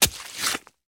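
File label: drawing a long sword from prey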